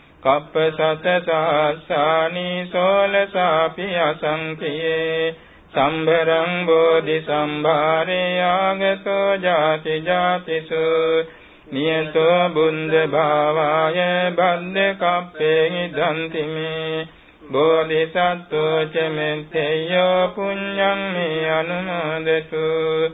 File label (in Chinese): 与弥勒佛分享功德, 课诵; 午课; 龙树林; TheravadaDatabase